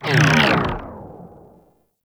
Proj Small Fire; Warframe; Lizzie

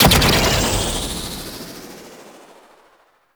Boost Thruster; Warframe; Archwing Prime

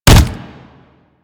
Generic Hit; Warframe; Characters; Tenno

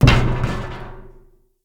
Ruins Machine Surface Impact; Props

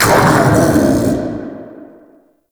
Uriel Brimstone Cast Vocal; Warframe

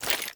Generic Footstep; Dagath

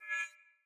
Long Sword Whoosh Accent; Weapons; Player; Swords; Pothia

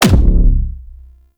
Concrete Archgun Punch; Warframe; Archwing

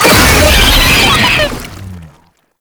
Death, Vocals; Enemies; Warframe; Proto Infested; Brachiolyst

